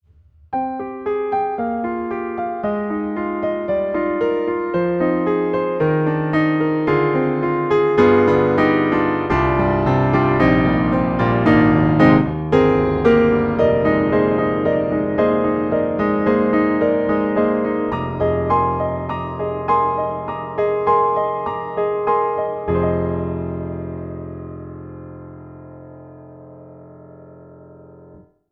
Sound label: Pianoteq D4 Jazz AB, piano; MODARTT S.A.S.